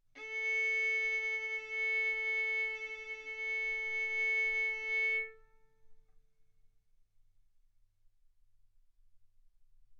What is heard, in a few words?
Violin arco mf sul A A4 B4 stereo